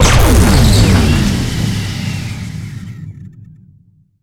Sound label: ambience prime laser pulse